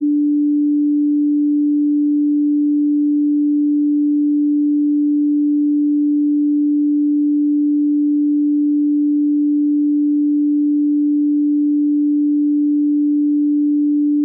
294Hz; Musical notes and their frequencies.

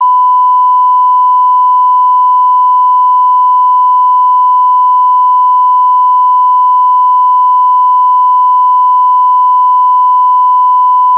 1kHz, test tones

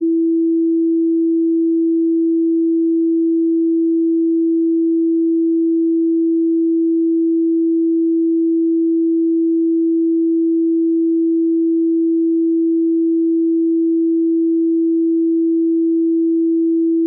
330Hz; Musical notes and their frequencies.

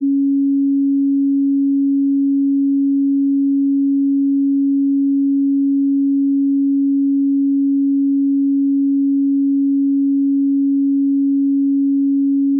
277Hz; Musical notes and their frequencies.

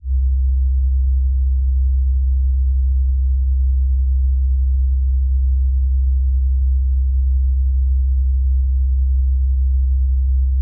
65Hz; 65.406; C₃; c Small

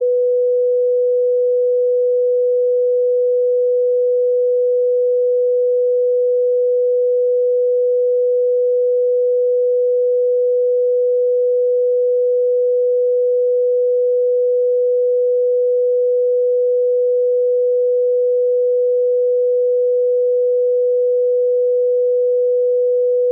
494Hz; Musical notes and their frequencies.

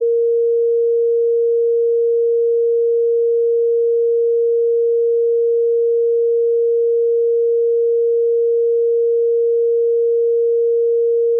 466Hz; Musical notes and their frequencies.

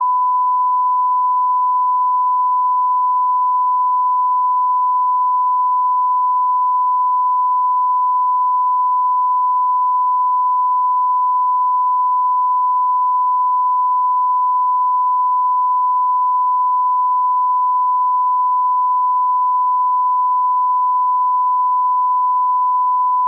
1kHz, test tones

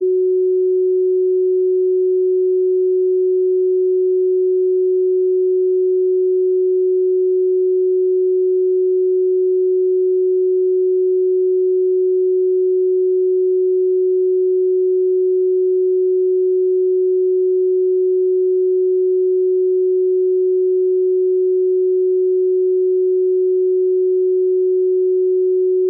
370Hz; Musical notes and their frequencies.

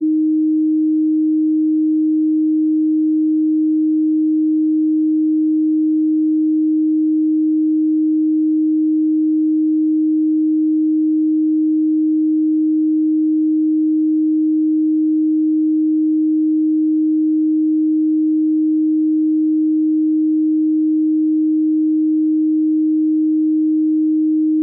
311Hz; Musical notes and their frequencies.

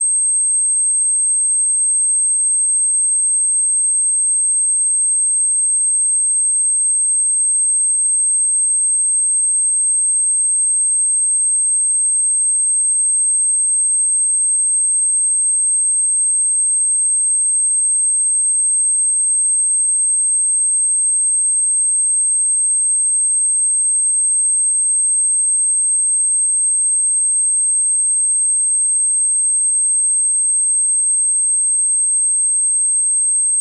8372Hz

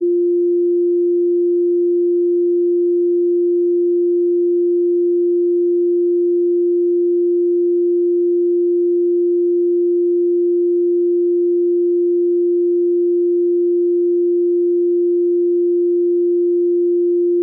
349Hz; Musical notes and their frequencies.

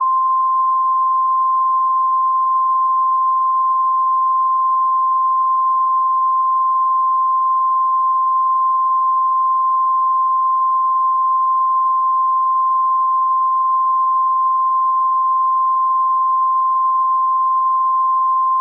1047Hz

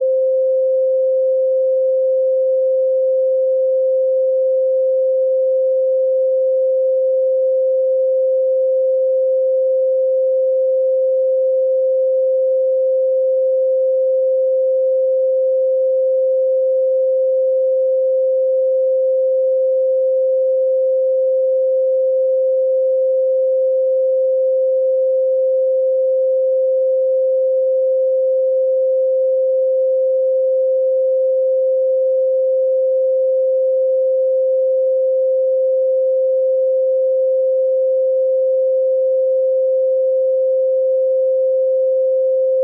523Hz; 523.251; C₆; c′″ Three-lined

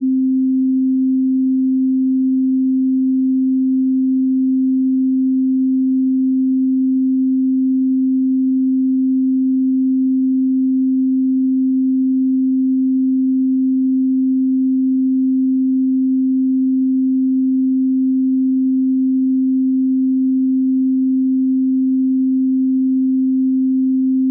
262Hz; 261.626; C₅; c″ Two-lined

